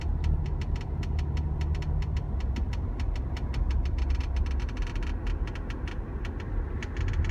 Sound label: passenger cabin rattle noise rattle